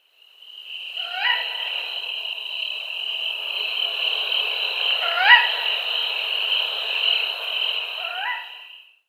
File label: Tawny Owl mauritanica female call